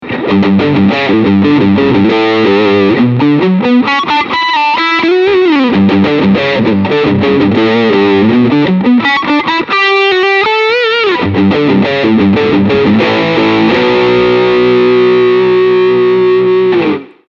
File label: High Gain Riff